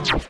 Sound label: disc alarm fx buzzer; nova sound